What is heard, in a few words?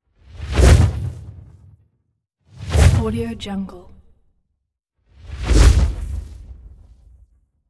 whooshes; transitions and movement